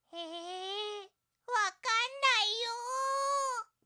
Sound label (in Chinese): 訊息（提示）